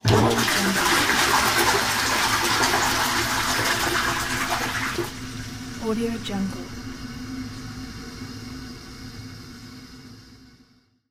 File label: flushing toilet